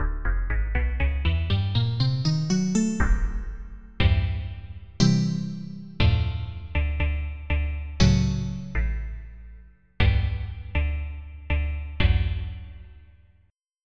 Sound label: Velo Reso